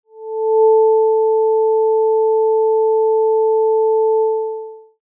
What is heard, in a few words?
adsr